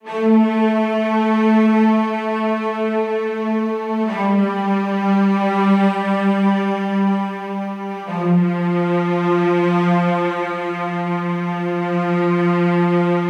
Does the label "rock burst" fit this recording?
No